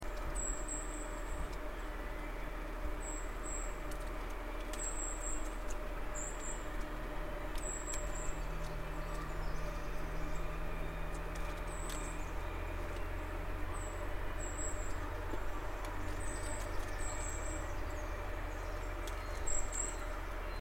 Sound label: high calls